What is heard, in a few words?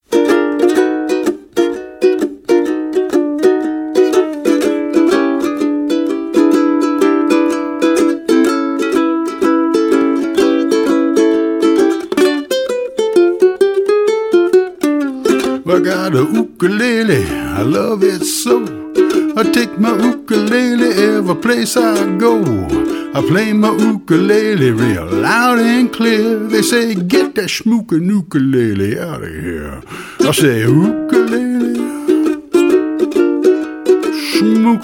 Ukulele